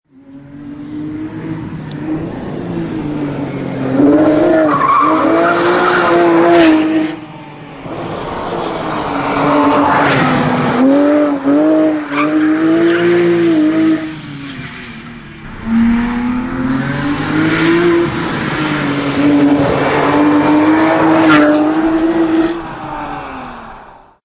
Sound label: gt3 drive past, porsche